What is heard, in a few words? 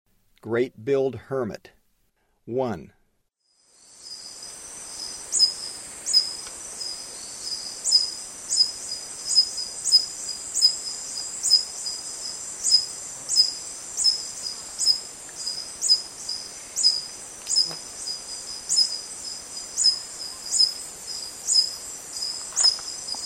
Great-billed Hermit; S. America; Eastern Lowlands